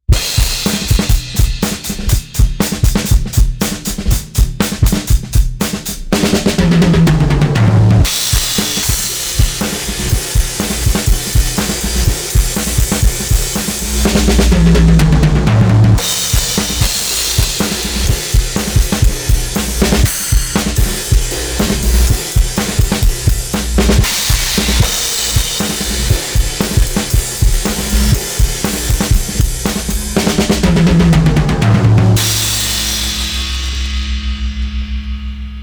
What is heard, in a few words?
Drum SPREAD S; Gainstation